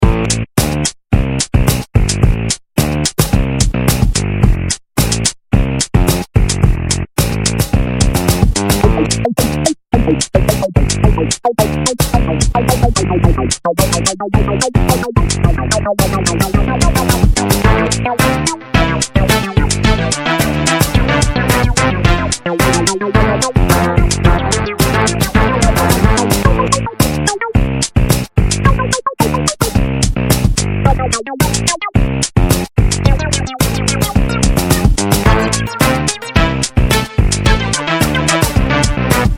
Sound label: instrumental